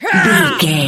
voice female attack

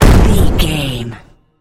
explosion large

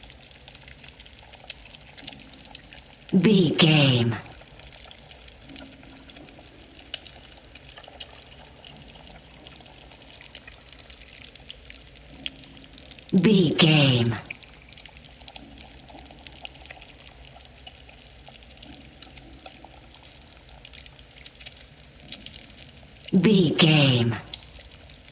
cave ambience loop

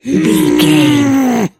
voice female growl

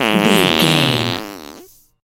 fart squirt

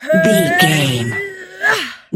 voice female effort push

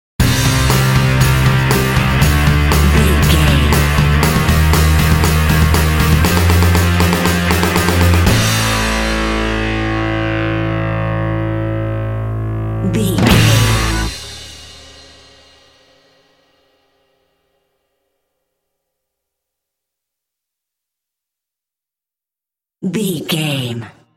noise god sting

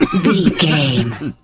voice male cough long

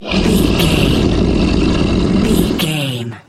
troll monster growl

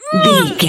voice female effort push fast

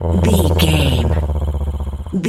cat purr deep

